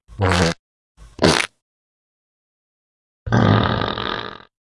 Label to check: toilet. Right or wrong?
Right